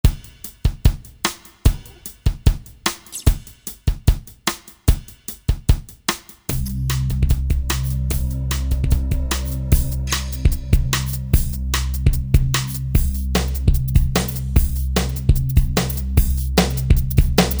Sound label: drum lesson